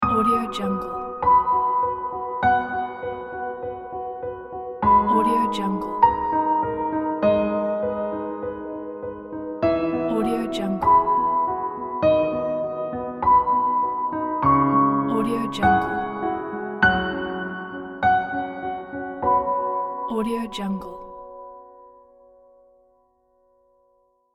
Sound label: piano dreaming ident